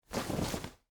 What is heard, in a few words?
Jeans Pick Up, Foley, Clothes; Dressing (Sound Effects:Human)